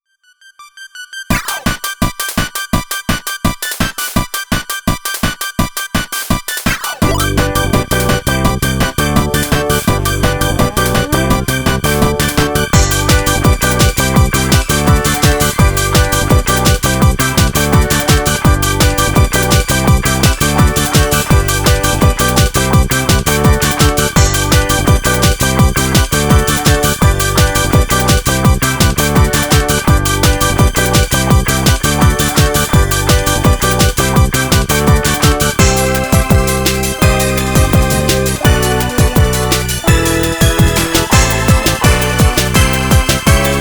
instrumental

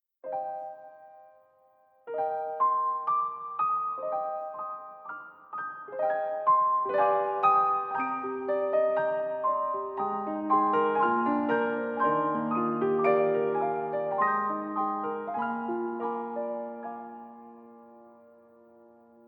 Piano Duo

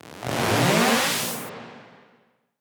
Zap, PsyTrance

